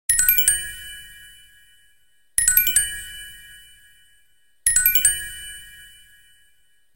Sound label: Discreet, ringtones; Sony Ericsson; K660i